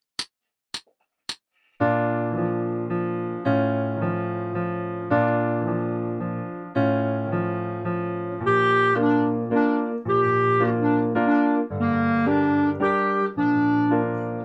Wooden Shoe Dance Clarinet; 10pct Slower

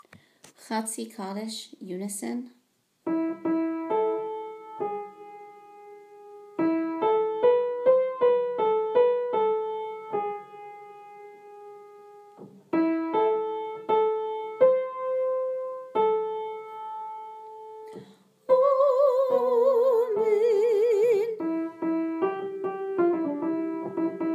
Unison; Chatzi Kaddish/Alter

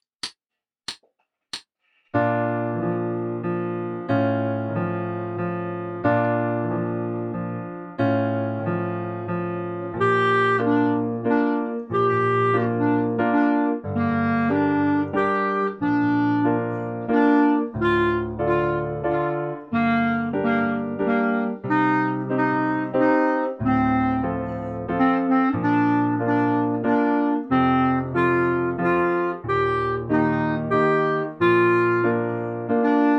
Wooden Shoe Dance Clarinet; 30pct Slower

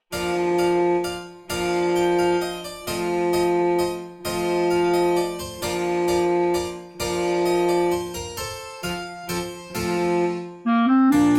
Carnival Clarinet; 10pct Slower

